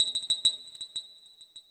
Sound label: FX Industrial 140